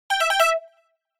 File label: Correct